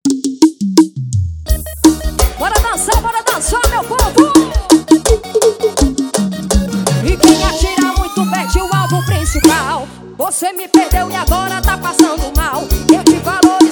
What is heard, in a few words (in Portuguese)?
forró ao vivo